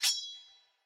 minion sword; smite; npc; roman archer

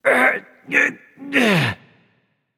grunt lift; smite; voicepack; osiris

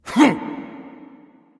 grunt; smite; guan yu

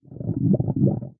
Stomach Gurgle; smite; kumbhakarna

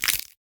idle knuckle crack; smite; kuzenbo

